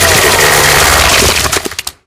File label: chainsaw gore; npc; melee; infected